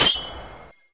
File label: ice wall; wizard